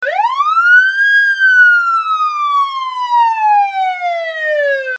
Police Sms

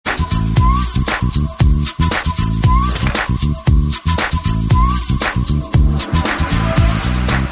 Flute; 7th Sense

